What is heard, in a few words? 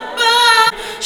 VOX LOOP, Funky Vocals; USB Soundscan vol.18